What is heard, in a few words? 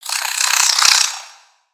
Spider Angry; creatures mechanical; Lego